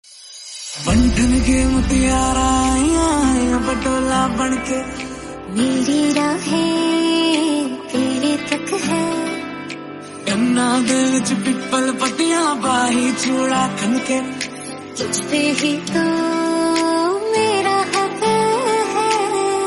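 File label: MASHUP HINDI SONG RINGTONE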